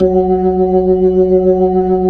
B-3, Jazz

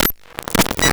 Cwejman S1 Prikle Snare Fried Pager; AFG; 300 Drum Machines; DevSnd Cwejman S1 - JK

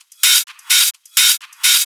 Hihat Loop 128 BPM

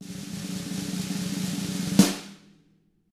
snare roll; ILIO; Double Platinum Drums 1

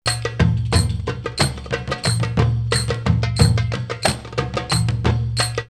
Telly Dance 90, drumloops World Ethnic; X-Static Goldmine

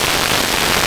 STATIC, SP1200; E-MU Producer Series Vol. 8 – Platinum Phatt; PlatinumPhattCD1

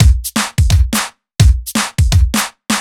Kit Heavy Swing, 85bpm; off the grid

